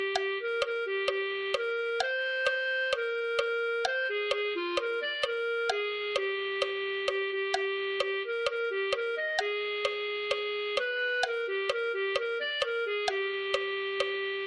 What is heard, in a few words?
clarinet